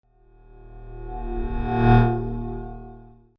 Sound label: Scanner Beam By, Scifi; Science, Fiction; Tech Sound Effects; SoundEffects-BlastWave